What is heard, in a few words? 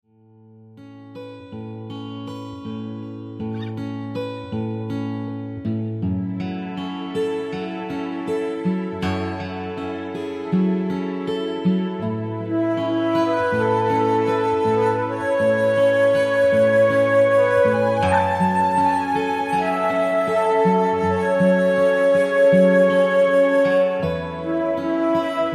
Relaxation Meditation Yoga Music